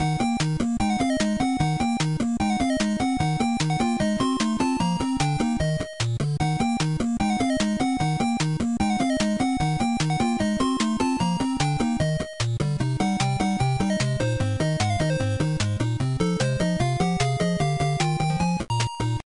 Game Over; Monster Party

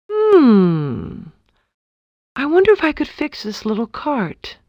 Fix Cart

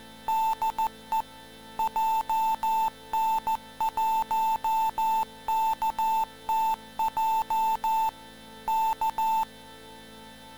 Morse Code; Taito Chase HQ

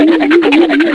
wobbly; Mickey USA 64

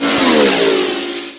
drive past; DKR64

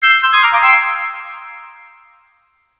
Fanfare; FFT

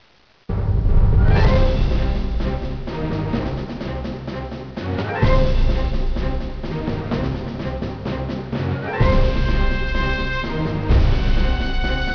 MUSIC; Army Men

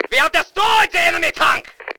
tank dest enemy

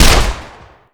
mp5 fire; Rust legacy

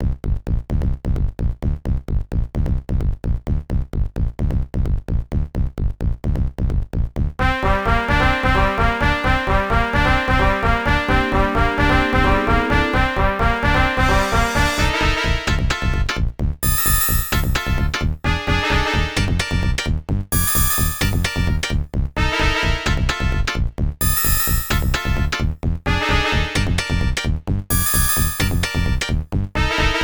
music; Nitrods; wariowaretouched

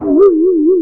wobbly; Mickey USA 64

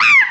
Diddy Damage Fly; SSB Brawl

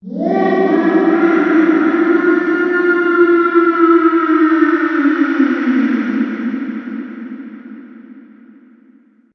ghosty

